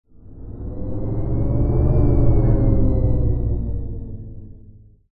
rts vehicle move animation particle swish swoosh accent logo sci fi futuristic